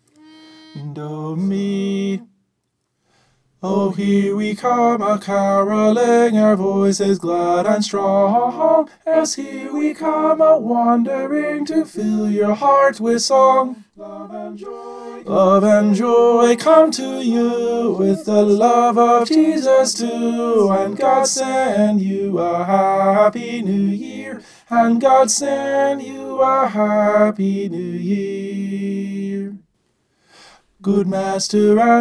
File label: tenor